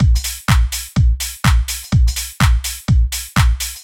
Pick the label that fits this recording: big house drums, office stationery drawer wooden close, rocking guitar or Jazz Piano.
big house drums